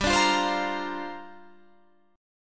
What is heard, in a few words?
G Minor 7th Add 11th; Gm7add11; strummed